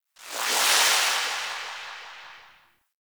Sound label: Power Rift Buff; Warframe; Zariman